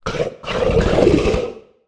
Humanoid Throat Sound; The Decline Of Warriors; Monster Sounds Volume II

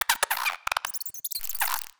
Tiny Creature Alien, Monster Sounds; The Decline Of Warriors; Monster Sounds Volume II

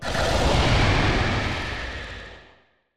Digital Creature Double Delay, Monster Sounds; The Decline Of Warriors; Monster Sounds Volume II

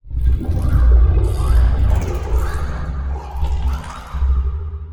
Alien Distant, Monster Sounds; TheDeclineOfWarriors; Monster Sounds Volume II

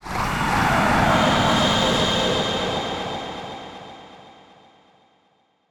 Distant Absctract Scream Short; Monster Sounds; TheDeclineOfWarriors; Monster Sounds Volume II

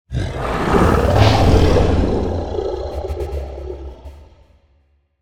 Digital Creature Whooshy Sound, Monster Sounds; TheDeclineOfWarriors; Monster Sounds Volume II

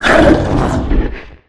Canine Agressive Fast Roar, Monster Sounds; The Decline Of Warriors; Monster Sounds Volume II

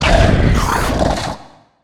Humanoid Mad; The Decline Of Warriors; Monster Sounds Volume II

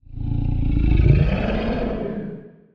Feline Roar With Breath Tail, Monster Sounds; The Decline Of Warriors; Monster Sounds Volume II

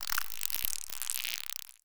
Tiny Creature Insect, Monster Sounds; The Decline Of Warriors; Monster Sounds Volume II

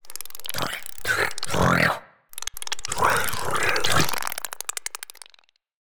Alien Tiny; Monster Sounds; TheDeclineOfWarriors; Monster Sounds Volume II

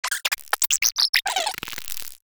Tiny Creature Robotic, Monster Sounds; TheDeclineOfWarriors; Monster Sounds Volume II

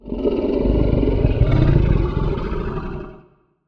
Feline Long Roar; The Decline Of Warriors; Monster Sounds Volume II

